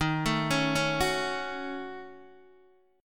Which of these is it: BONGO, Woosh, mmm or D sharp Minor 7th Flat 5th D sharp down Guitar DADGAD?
D sharp Minor 7th Flat 5th D sharp down Guitar DADGAD